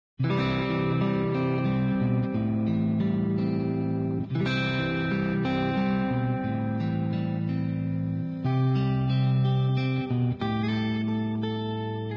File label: Guitar Electric; Instruments